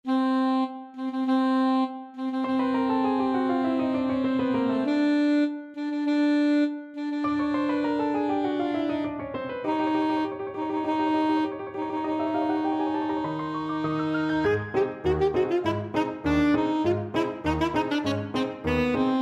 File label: ASAX, saxophone